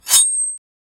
a warriors iron shield bl, block

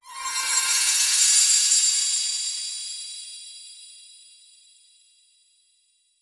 magic shimmer spell being

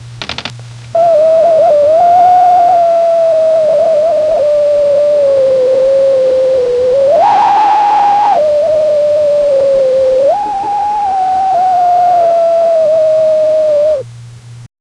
wind howls a screech claws; creepy

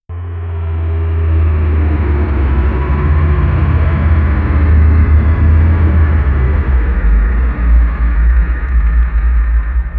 massive ancient machinery

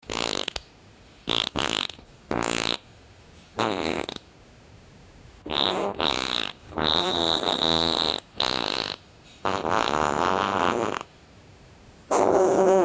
farting rooster; farmyard

